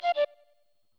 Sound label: Instr Flute; backpack hero gamerip 2022